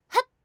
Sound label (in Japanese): 攻撃